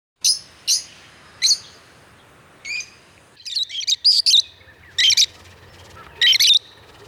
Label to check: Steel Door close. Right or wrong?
Wrong